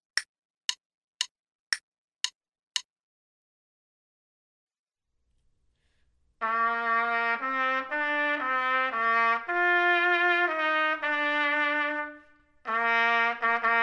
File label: trumpet; Selected Duets for Trumpet Podcast